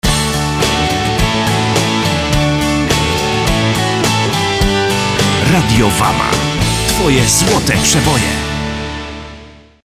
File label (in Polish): JINGIEL; WAMA